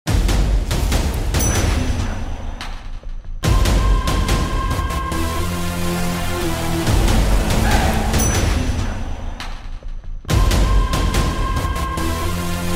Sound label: Mass Bgm; Bigil